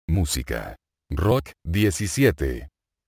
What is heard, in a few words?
rock